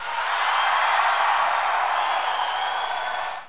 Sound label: goal